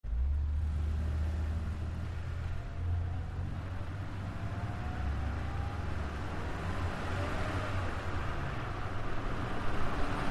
Car Tires Asphalt

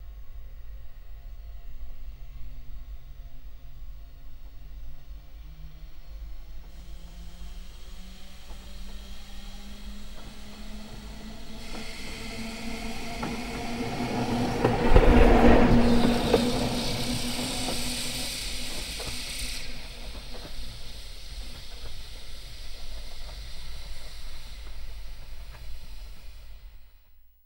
Elect Bus By, electric bus

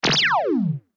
Laser Gun; lasers

